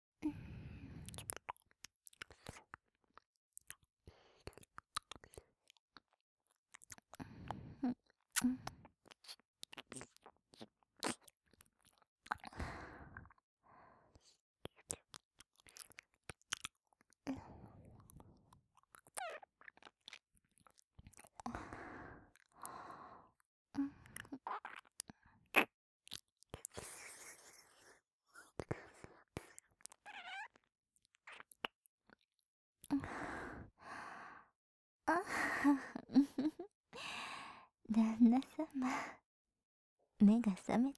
癒しの耳舐め,ASMR; 六海さん; 癒し系専属ナースの七海さん六海さんに愛されちゃうバイノーラル

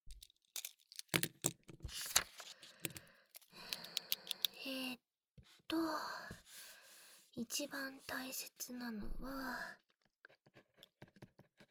お耳かきかき; お耳かきかき見習いタイムばい♪～山本耳かき店監修～

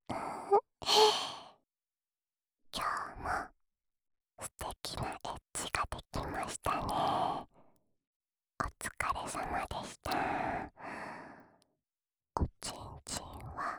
添い寝用吐息ループ（右耳）

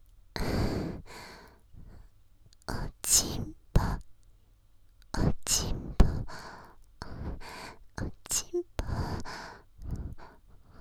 喘ぎ; お屋敷メイドのハーレム淫語ヘヴン; バイノーラルオナサポ音声

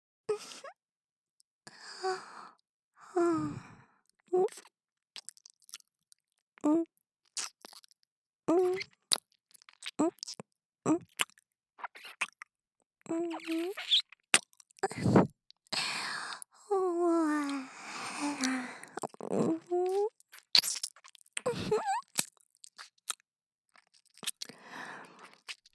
三舐め 左

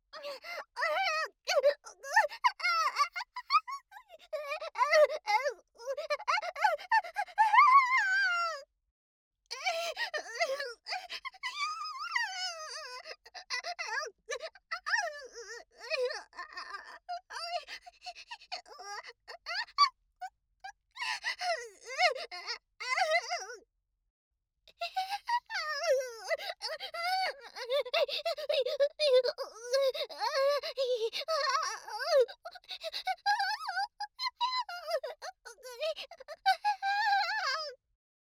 SEX・激しい喘ぎ声と悦びの声; おとなし少女系カノジョ

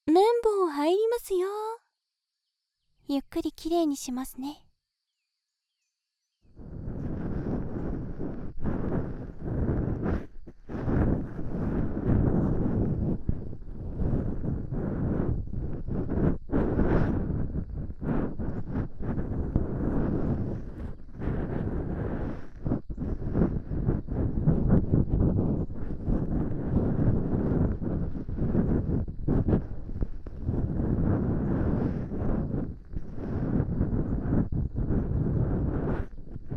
綿棒で丁寧に耳かき

